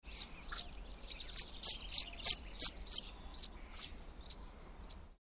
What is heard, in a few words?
House sparrow